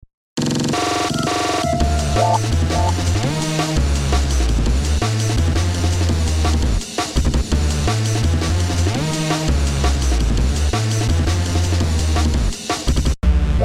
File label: electronica